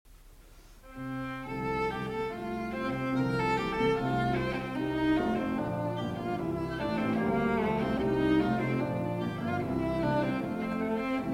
Cello Sonata No. 2 in F Major IV. Allegro Molto (Live); Brahms; Op. 99